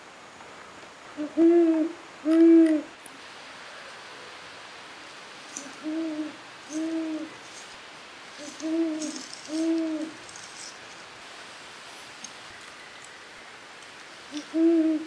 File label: Bubo virginianus; Amerikaanse oehoe; uilen; Strigidae